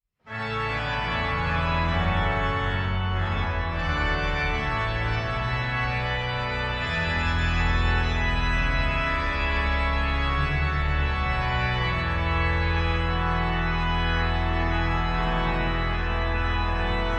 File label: Organ Sonata no 3 in A Major Con moto maestoso